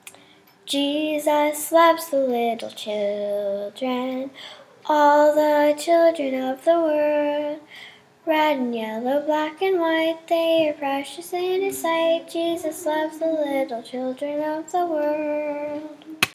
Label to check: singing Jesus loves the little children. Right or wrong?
Right